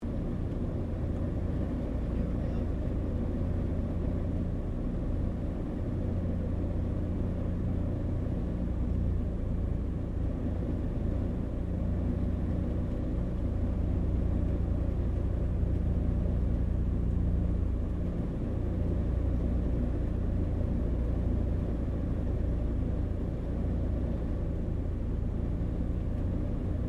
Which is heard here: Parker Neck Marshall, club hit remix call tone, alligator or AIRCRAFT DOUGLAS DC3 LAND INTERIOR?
AIRCRAFT DOUGLAS DC3 LAND INTERIOR